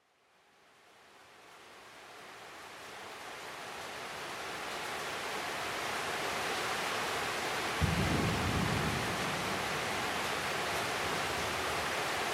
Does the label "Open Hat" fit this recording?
No